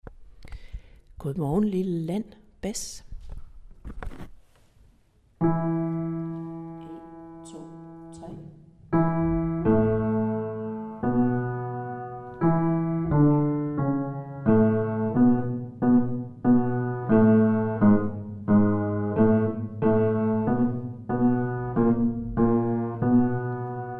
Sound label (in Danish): Bas